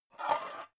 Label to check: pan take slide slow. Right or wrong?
Right